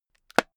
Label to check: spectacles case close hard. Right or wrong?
Right